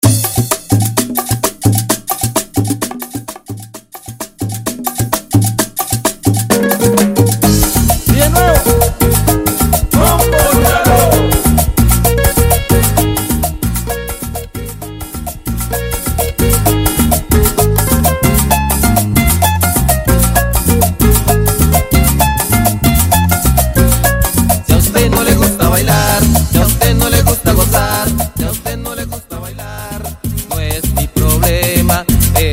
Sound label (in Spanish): Intro Simple 130 Bpm